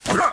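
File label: barbarian footstep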